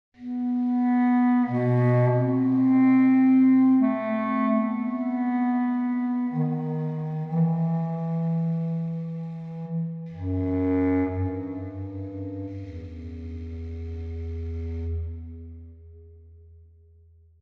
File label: Melody; bramble the mountain king; ps4 ps5 switch windows xbox one xbox series xs; Lyktgubben